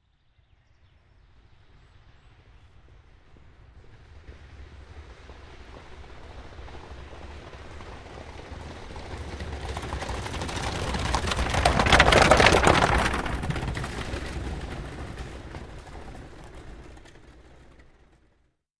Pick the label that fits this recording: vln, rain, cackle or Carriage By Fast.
Carriage By Fast